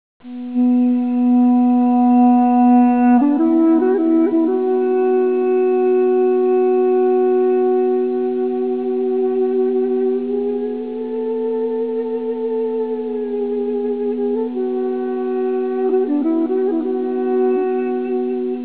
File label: balaban sound